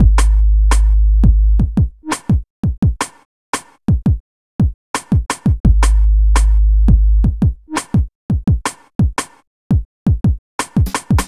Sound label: Beat, Hip-Hop